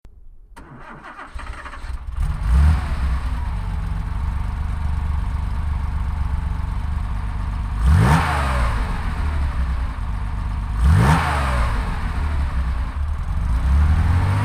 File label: 911 carrera 88